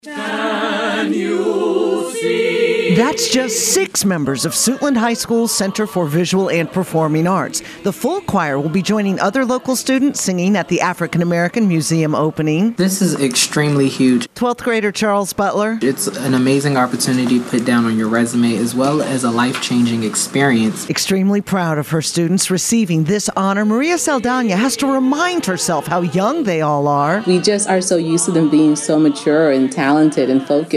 choir

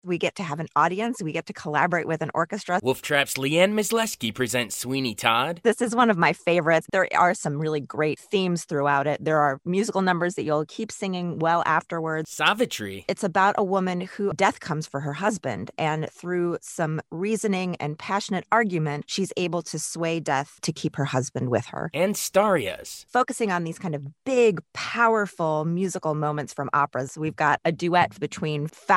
opera; WOLF TRAP

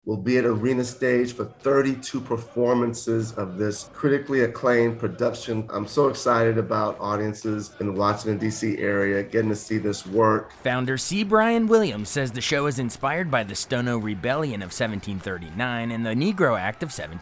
drumfolk

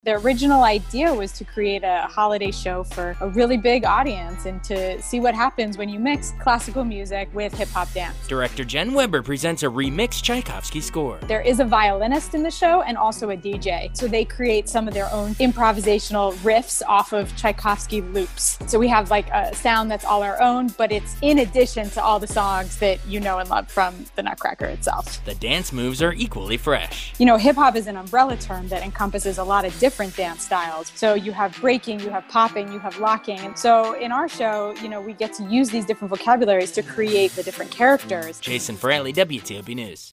hip hop nutcracker

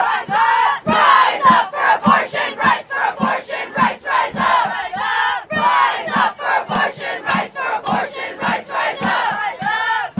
nat sound

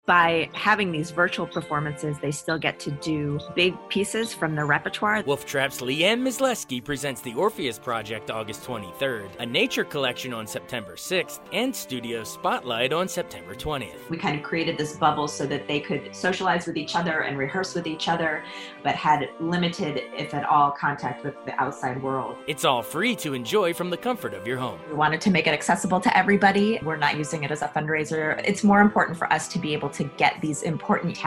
opera; WOLF TRAP

